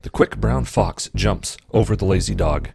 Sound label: octaver